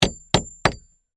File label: Hammer Hit Nail, Industry, Hammer, Hit, Nail, Heavy, Spike; Hand Tools; Three, Times